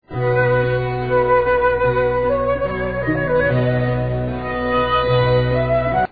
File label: flute